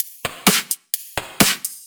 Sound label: Electro Loop 128BPM; Vengeance Samples